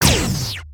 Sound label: double blaster fire; weapons; aronwk collection; ndaudio